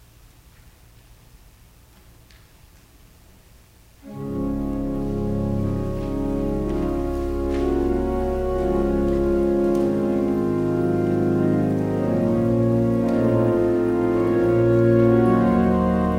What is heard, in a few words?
Organ Music; jesus mercy to one taken with palsy